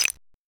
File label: Oberheim DX